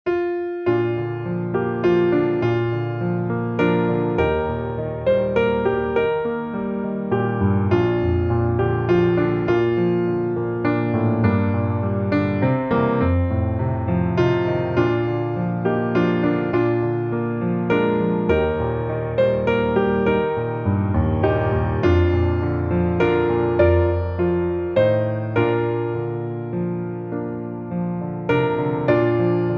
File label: piano